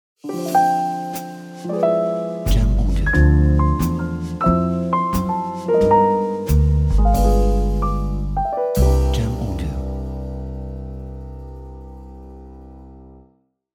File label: Jazz Swing Ident